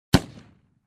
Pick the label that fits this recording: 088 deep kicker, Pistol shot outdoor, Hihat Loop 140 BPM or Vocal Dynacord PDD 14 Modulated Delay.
Pistol shot outdoor